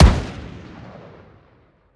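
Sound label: weapon ar fire player atmo exterior; gmod; viper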